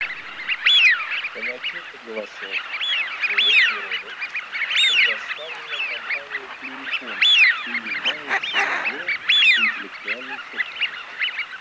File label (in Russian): Свиязь Fischione; plurifon